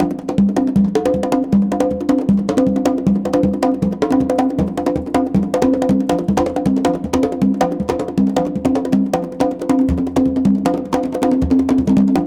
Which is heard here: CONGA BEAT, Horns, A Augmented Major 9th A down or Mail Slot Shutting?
CONGA BEAT